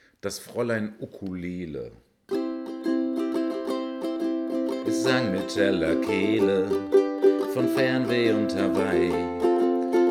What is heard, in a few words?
Ukulele